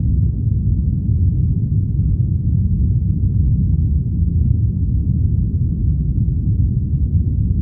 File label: blowout; STALZONE